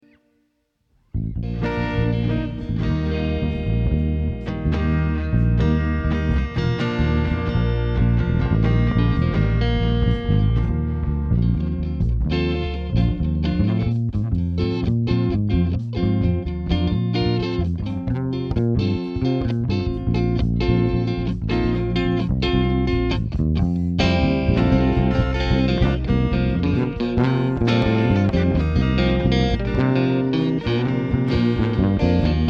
Strings

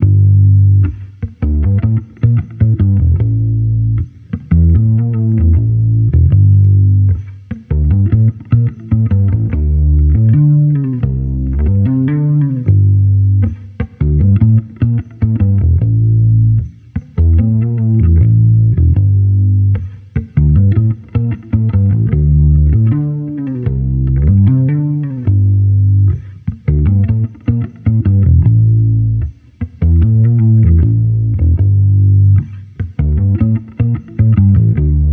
Amped loop 76 Gm, Electric Bass Loops; Slate Digital BASSHOLE Sample Pack

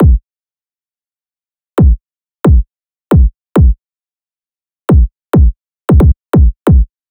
kick 135, Kick Loops; drum sample pack; SEISMIC; Slate

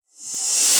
hat open rev, drum sample; Drum Sample Pack; One Shots; Hats; Slate; SEISMIC

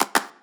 clap one shot, Pop drums; Slate; SD PASADENA Pop Sample Pack

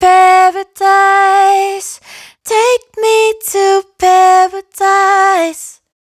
Dry Vocals hook Paradise 120 Em; Raw Vocals; Slate Digital Omnivox Vocal Sample Pack

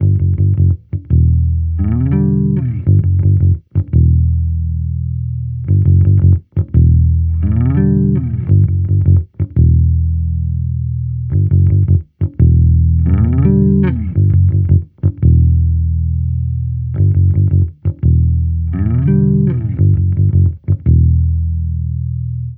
Amped loop 85 Em, Electric Bass Loops; Slate Digital BASSHOLE Sample Pack

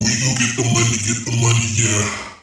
phrase, Vocal; Slate Digital Omnivox Vocal Sample Pack

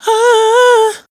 riff Huh Em, Dry Vocals; Raw Vocals; Slate Digital Omnivox Vocal Sample Pack

